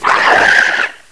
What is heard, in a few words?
Jump Attack; Atlantean; Playable Tutorial Level; TR1